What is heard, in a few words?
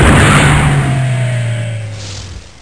Switch; Descent2